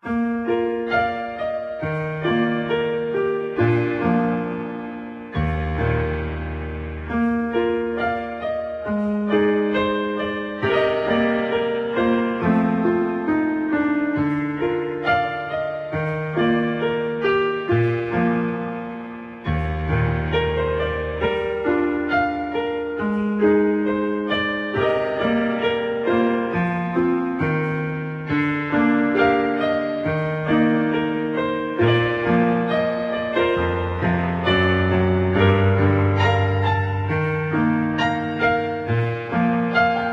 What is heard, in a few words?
Happy Piano Music; menherafflesia flowering abyss; android